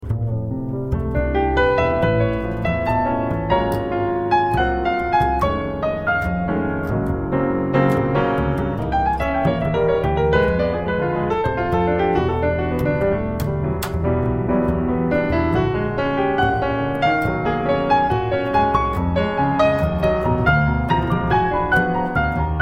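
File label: piano solo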